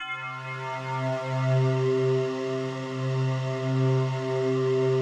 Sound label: PAD, DIGI-PADS